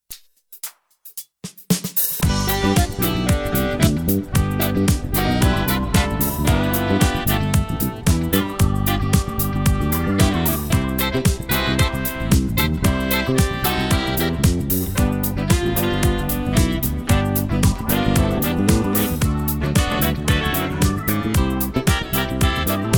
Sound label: FUNKY SOUL